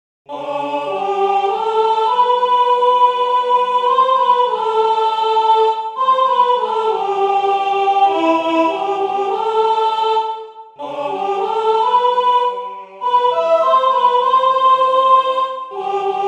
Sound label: alto